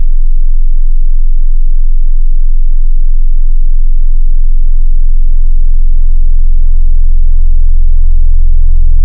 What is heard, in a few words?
Dist Soft Clipping, wavetable